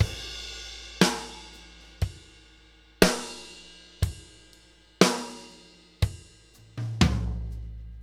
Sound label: Laid Back New York Loop, Drum; Sonic Foundry (Sony Creative Software); Loops & Samples Drum Tools